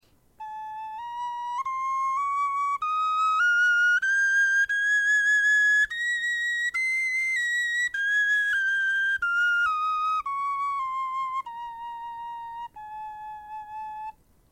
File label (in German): tonleiter